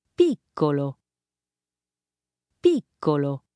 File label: piccolo